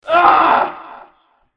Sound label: scream